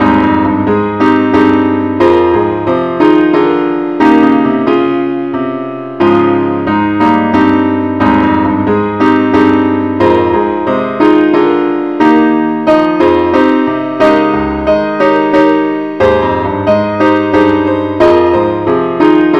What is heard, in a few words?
keyboard only key aes; Amazing Grace; John Newton